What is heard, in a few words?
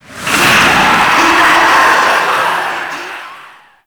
Stadium Infested Swarm Stinger; Warframe; Ambience; Nineteen Ninety Nine